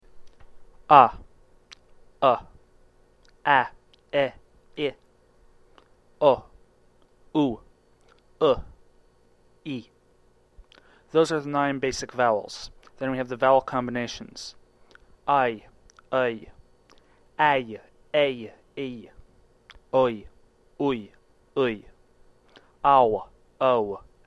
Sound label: Hee